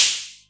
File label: Roland MC09 Hat O